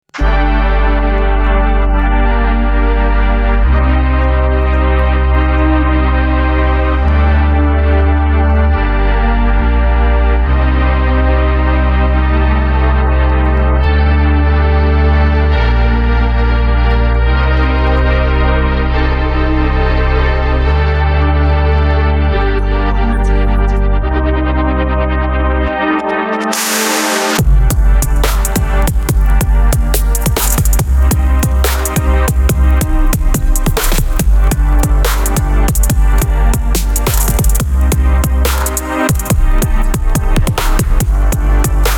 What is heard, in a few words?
instrumental